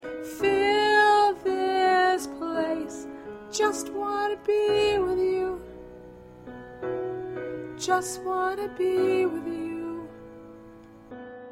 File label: Lead 1, choir